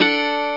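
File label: guitar d major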